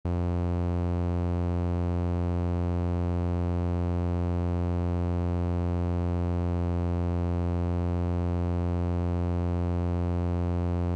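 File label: Krischer M8 F1 Osc clean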